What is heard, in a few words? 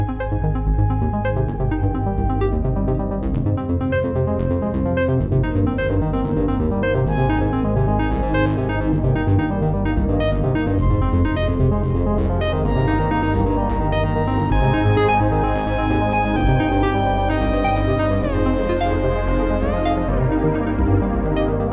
Samsung Galaxy Fold Default Ringtone